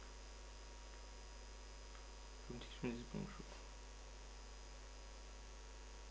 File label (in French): bruit electrique